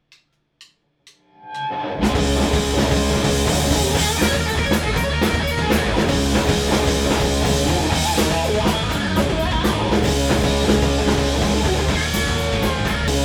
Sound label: solo